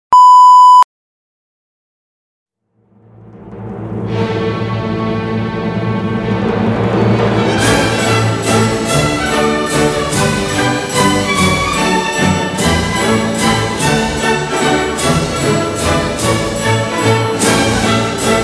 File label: Floor Routine Mazurka; Debilles Mazurka from Coppelia